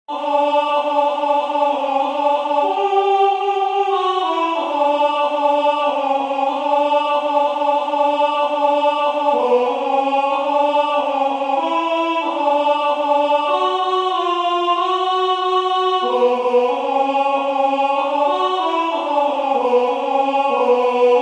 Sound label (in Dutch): 4 stemmen Alto